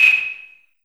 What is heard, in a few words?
LARGE ROOM 808, REVRB